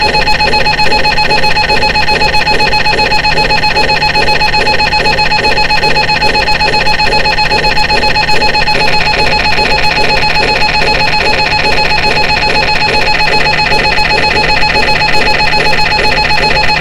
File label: Ambient Cryogenic DTI